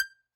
Hohner Super64 Accented rel G5, Harmonica; Aerophones; Free Aerophones

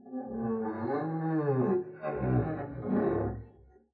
arco noise moan long, double bass; dsmolken; noises

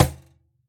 Cajon hit fff; Idiophones; Struck Idiophones

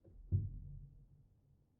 Player Ped Off, Upright Piano, Pedal; Chordophones; Upright Piano, Knight

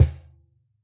Cajon hit f; Idiophones; Struck Idiophones

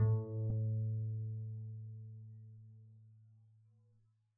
pizz a2, double bass; dsmolken

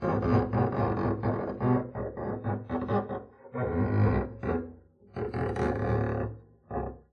arco noise creak extra long, double bass; dsmolken; noises

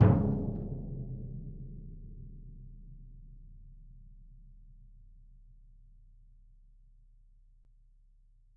bass drum f; Struck Membranophones; Bass Drum 3

